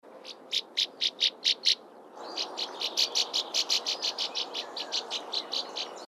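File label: SWALLOW NORTHERN ROUGH WINGED; species/birds; wbba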